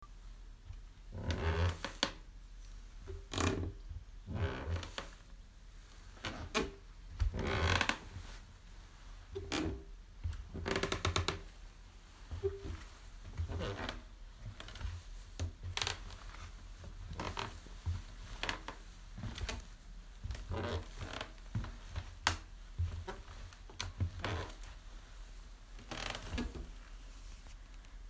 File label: Creaking